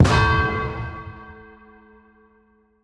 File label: Attack Impact; portal quest android; Cult Acolyte Blue